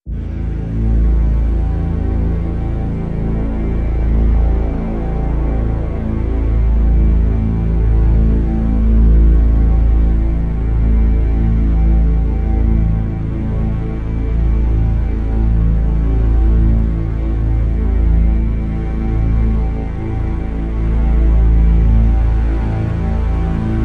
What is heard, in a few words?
Drn Ground Zero A